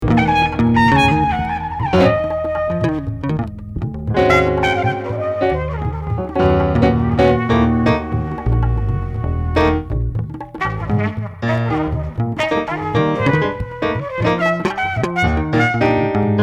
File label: jazz short